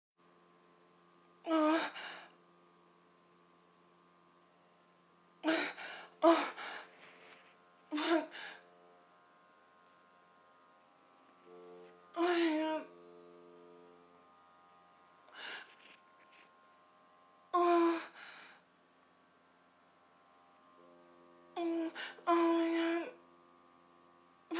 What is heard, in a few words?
orgasm